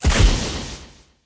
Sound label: forcetower dead, monster